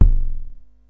falling apart kick; Kicks; single hits

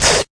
scratch, BeatBox Male

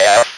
speak and spell F; ALPHABET

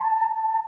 whirly tube A3 alt

